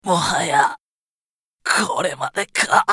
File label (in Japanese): 死亡; コインブラトゥルーパー